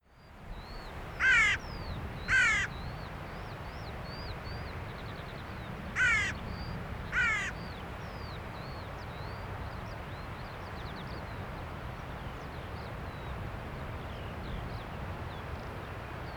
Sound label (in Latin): Corvus corone; SETE